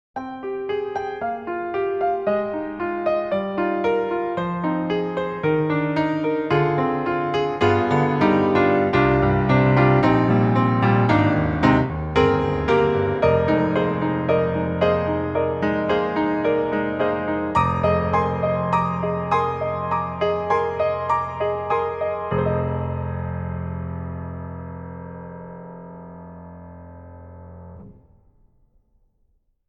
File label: Steinway D Wide; Embertone; Walker 1955